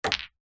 sport pool ball pit into net with other balls inside